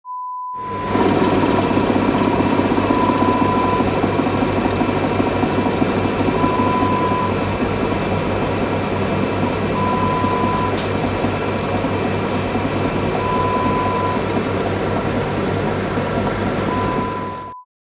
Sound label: vacuum pump sound